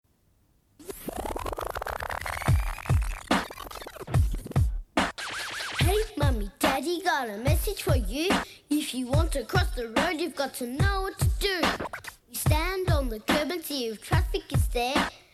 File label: rap